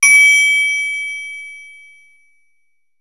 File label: synth bell; AKAI S6000 CD-ROM - Volume 5